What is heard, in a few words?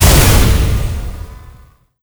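boss explosion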